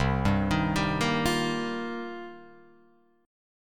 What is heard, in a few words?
C-Minor 11th-C-0,2,3,2,1,0, down, Guitar, Open F, Cm11 chord; arpeggiated; JGuitar